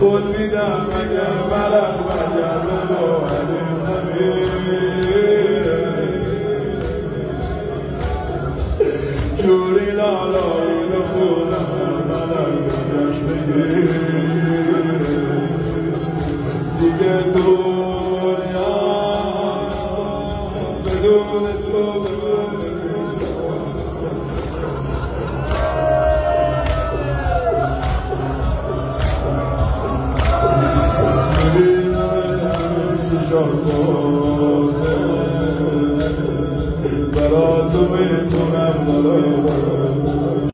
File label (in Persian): مداحی; aliasghar